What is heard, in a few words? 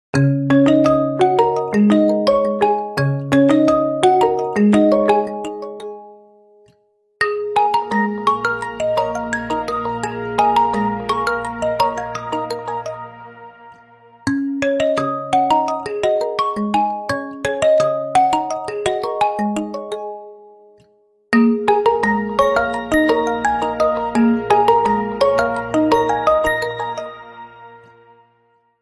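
original ringtones and notifications nokia 808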